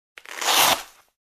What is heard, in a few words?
Blood Pressure Cuff rip off